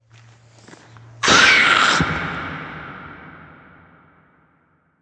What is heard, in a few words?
aaaaaaao